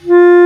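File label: Bass Clar p F3; Digital Sound Factory Virtuoso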